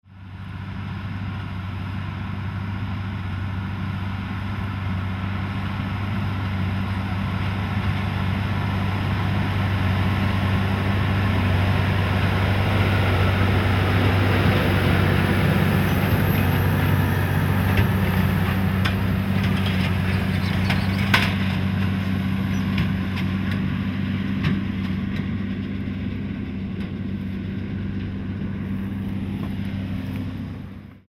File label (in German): Deutz mit Krone Big Pack, Deutz X720, Wassergekühlter Deutz Dieselmotor; Leistung: 262 PS; Hubraum: 7.1 Liter